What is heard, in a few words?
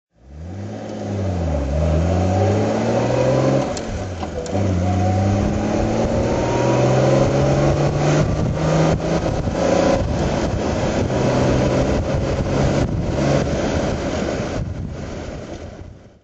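etype 4.2 s1 drive, engine sound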